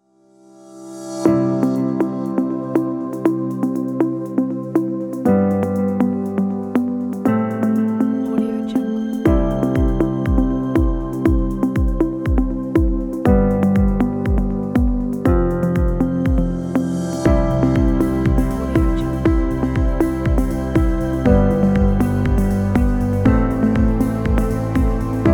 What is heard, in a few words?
ambient music